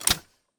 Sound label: Rocket Launcher Dry Fire; Items; Shooter